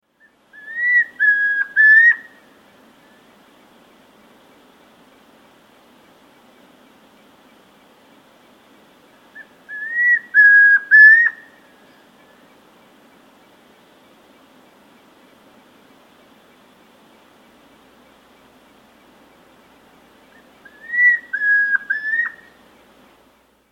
Chestnut-Crowned Antpitta, Nature's Songs, S. America